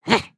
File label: Run; Lego Strombie; creatures organic